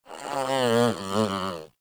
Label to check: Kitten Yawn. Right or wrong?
Right